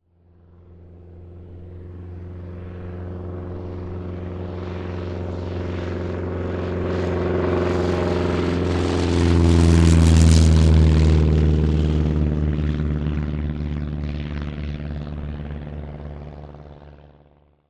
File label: flight